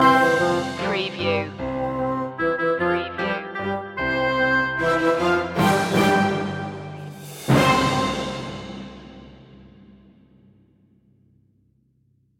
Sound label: we wish you a merry christmas orch loop end, Christmas Traditional We Wish You a Merry Christmas Orchestral